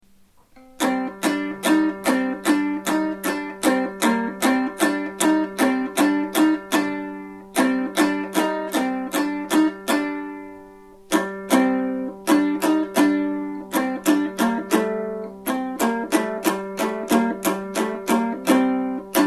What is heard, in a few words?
plectrum; Alan al Louarn; Contes celtiques